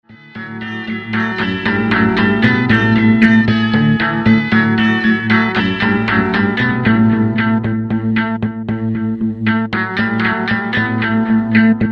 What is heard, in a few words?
el guitar